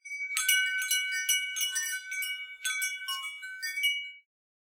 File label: Wind Chimes